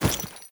Generic Footstep; Warframe; Temple; Foley

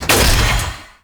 Turret UI Menu Open; Warframe; Zariman; Zariman Turrets

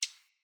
Bird Single Chirp; Paragon Bird Guide

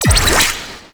Void Portal Off; Ambience; Props; Corpus Ship

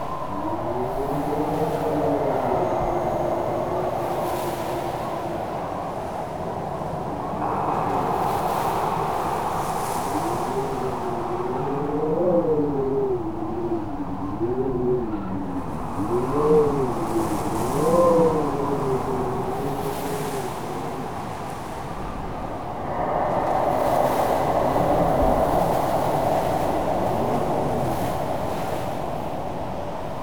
Exterior Foreground Desert Sad Scared Loop; Warframe; Duviri

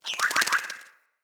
flock bird attack dive; Enemy; Sinecure; Ranged Hunter Thawtide; Abilities

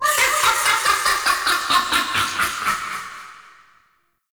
Laugh; Roathe Phase Transition; Warframe; Tau

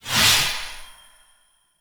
Hand Projectile, Weapons; Warframe; Duviri

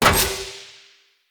Battle Dog Helmet Destroy; Snc Battle Dog; Foley

